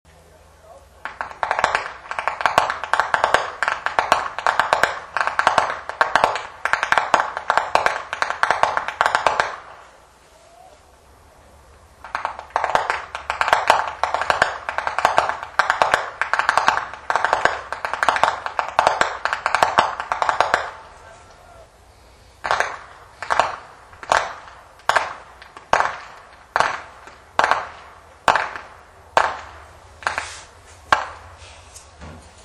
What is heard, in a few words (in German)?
schnalzen, flachgau; muntigl